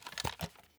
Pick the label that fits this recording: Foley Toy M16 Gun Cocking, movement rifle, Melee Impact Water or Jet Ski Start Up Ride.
movement rifle